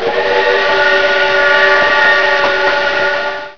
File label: train